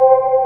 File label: PAD LOOP; akai; mpc1000; factorypresetsamples